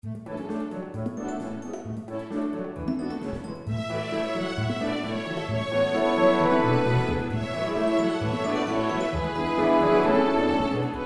full orchestra

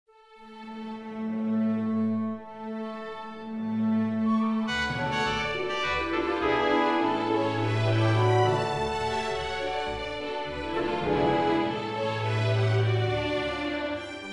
chamber orchestra

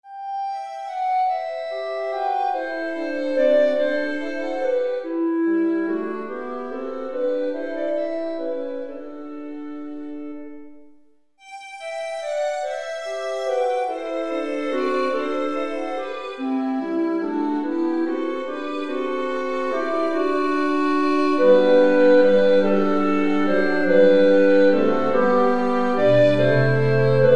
hymn

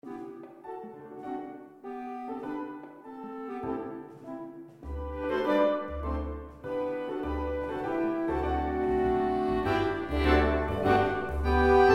concert band

